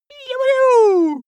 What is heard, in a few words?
cartoon male cheer